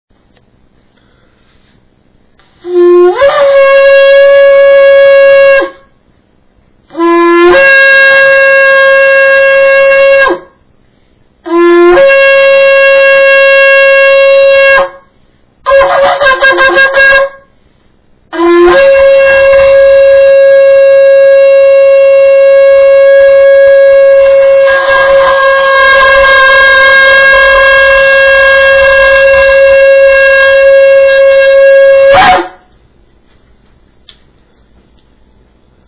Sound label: shofar